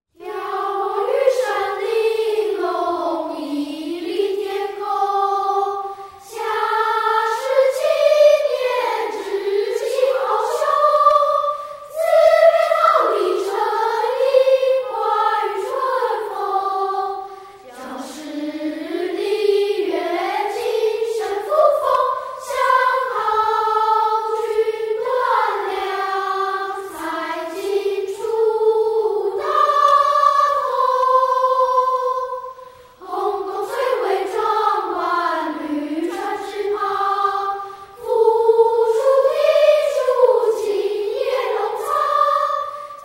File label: school song vocal